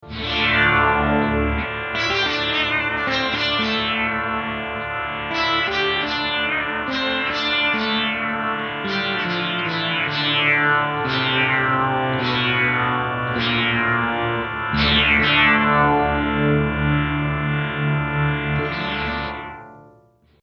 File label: Frozen Drone; Ravish